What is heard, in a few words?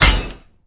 Impact; smite; god susano